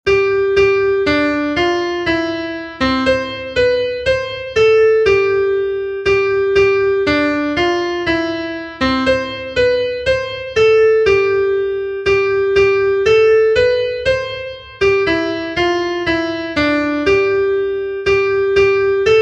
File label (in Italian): Canto popolare norvegese, pianoforte